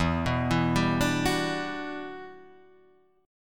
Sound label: E Minor 9th